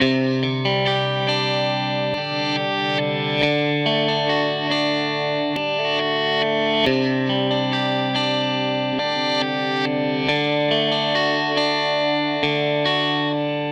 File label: Strat Guitar Loop